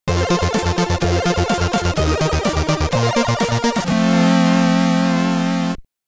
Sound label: Stage Clear, SID Stereo; pp hammer; commodore 64